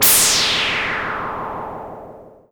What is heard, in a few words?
Casio MT 600 Explosion